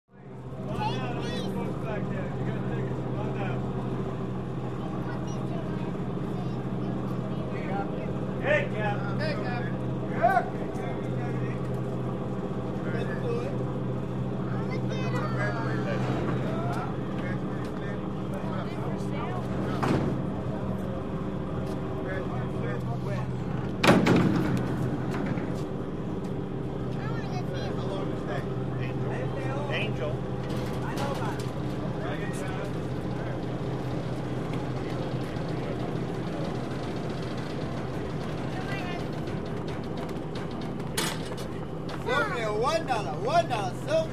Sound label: Ferry Boat Cl Walla